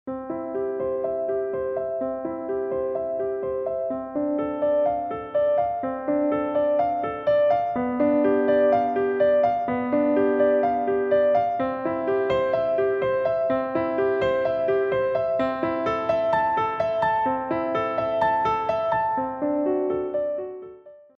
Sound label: prelude no 1 c major